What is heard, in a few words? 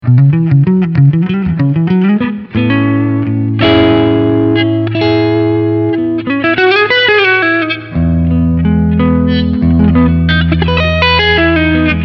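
Front Humbucker Clean Blues, guitar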